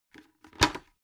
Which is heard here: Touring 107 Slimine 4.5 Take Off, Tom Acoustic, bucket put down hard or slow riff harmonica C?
bucket put down hard